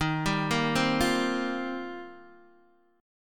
D sharp 6th Add 9th, Guitar, DADGAD

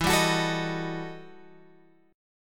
E Minor Major 11th E, guitar; {12 10 X 11 10 11} strummed

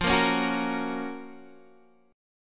Gb Major F; Gb/F strummed; JGuitar

